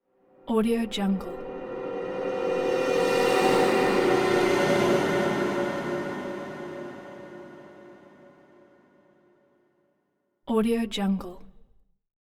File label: harsh metallic scary drone